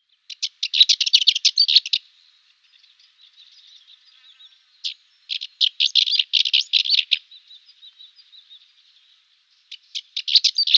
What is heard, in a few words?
Cyprus Warbler song